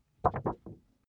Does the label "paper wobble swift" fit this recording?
Yes